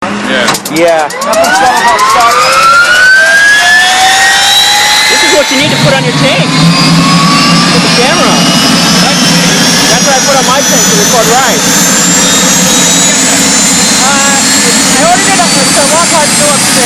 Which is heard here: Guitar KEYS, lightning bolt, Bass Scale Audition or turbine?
turbine